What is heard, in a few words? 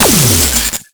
Antimatter Drop Fully Charged; Warframe